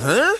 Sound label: huh male, Voice